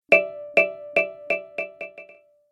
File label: App Delay, ping; multimedia